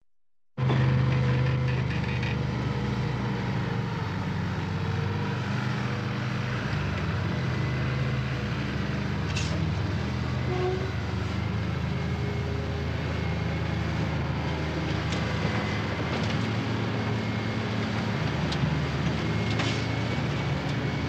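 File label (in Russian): строительство шум техники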